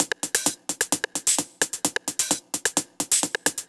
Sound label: 808 Hats, Drum Loops 130bpm; Zero-G; Soundclash Nu Dance Electro; Acidized Wavs; 808 Land